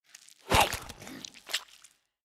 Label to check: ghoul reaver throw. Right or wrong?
Right